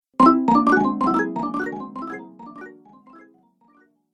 App Delay, ping; multimedia

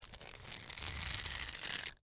Rope Twist Heavy, Cable; Hand Tools

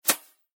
Pneumatic Hose, Scifi, Disconnect, Expel; Science, Fiction; Spacecraft

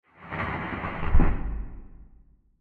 Creature Transition With Reverb; Scary Soundscape; Horror; Scary Soundscapes; CraftedSignals

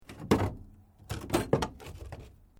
Metal Light Movement Minor

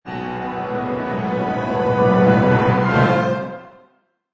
Orch Crec

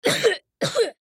Human Cough; Cough Short Male Twelve Yrs Boy; Sick; Blastwave; Humans

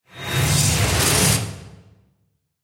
Whoosh Mechanical, Metallic, Ring

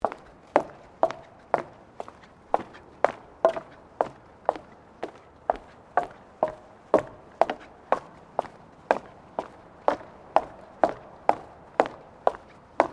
FOOTSTEPS WOMENS LOW HEELS BOARDWALK SEQUENCE; Footstep Foley; Footsteps (Sound Effects:Human)

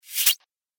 Chirpy Whoosh; sound design

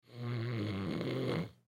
Human Snore, Snore Element Male 25 Yrs; Snore Breathe Element Male 25 Yrs; Snoring; Blastwave

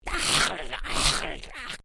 Created Creature Small Chomp, Alien Sound Effects; Created creatures, vocalisations and pretend speech

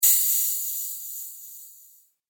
Spell Grains Of Truth, magic sound effect

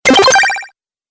Arcade 8Bit Robot Fx, video game; Twistedtracks

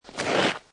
Snow And Ice Ice Scrape Small; Ice (Sound Effects:Weather)

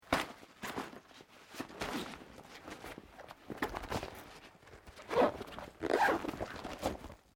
Backpack Nylon Small Compartment Zip Open; common day pack; Zippers (Sound Effects:Objects)

